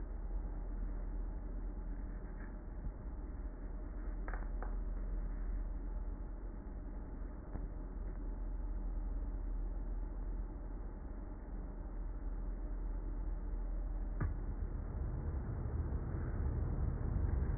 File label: whine 4000hz; lighthouse-v2